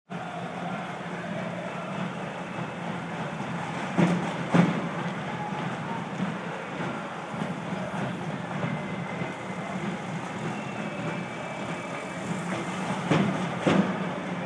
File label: pedestrian tantrum from 150m